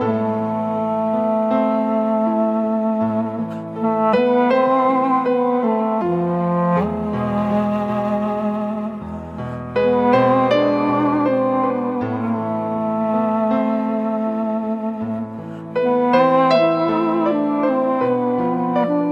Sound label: flute gliding, Sad; Loops